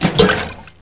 flamethrower ammo; darkship

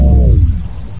big stop; svencoop; uplink extended

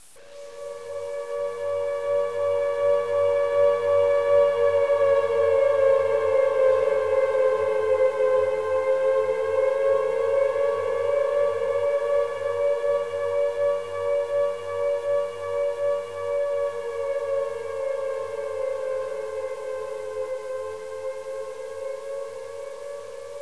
siren hell

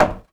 wood, player steps; quake2

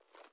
357 fidget; weapons; svencoop

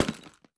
boot, player steps; quake2

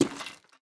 junk, player steps; quake2

